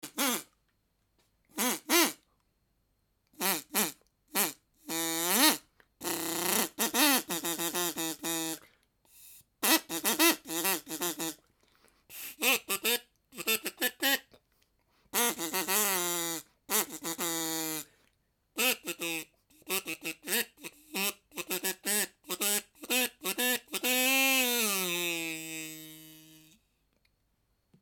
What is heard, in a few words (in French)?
COIN COIN, Imitez le chant du canard en soufflant par l'extrémité de cet instrument en bois